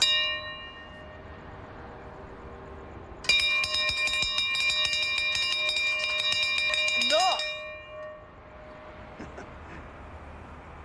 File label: Happy Rings the Bell